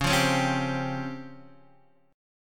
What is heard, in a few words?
Db Major 7th Flat 5th, Guitar DADGAD